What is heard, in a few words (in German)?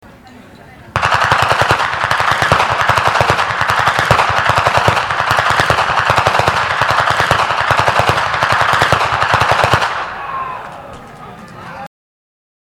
Schnalzen; Ainring; Ainringer Schnalzen